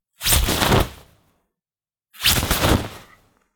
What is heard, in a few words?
Parachute Opening